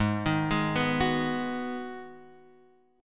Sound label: Ab Minor 7th, down, Guitar, Half Step Down